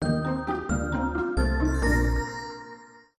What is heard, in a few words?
Ball Bounce; My Little Pony Magic Princess